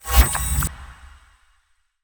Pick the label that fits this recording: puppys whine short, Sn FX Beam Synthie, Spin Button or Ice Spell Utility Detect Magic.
Ice Spell Utility Detect Magic